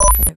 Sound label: BEEP; MACHINES